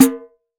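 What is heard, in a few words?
Pandeiro Open; Roland BK-5; Percussion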